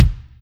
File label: Kick, Music; HapticSamples; Feel; NiceVibrations